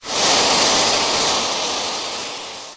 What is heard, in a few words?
nitrous oxide